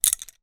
office paintbrush small artist wooden drop onto others